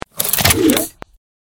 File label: skill snake